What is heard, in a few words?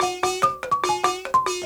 Bent Mba Phr, Marimbas; Roland LCDP11; Africa VOL-1